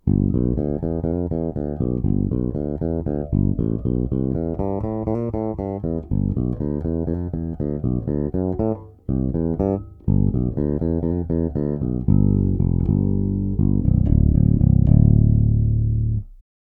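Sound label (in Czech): Warwick RockBass Infinity 5 Fretless, Warwick Red, dvojcívka sériová - nic; nerezovými červenými Warwicky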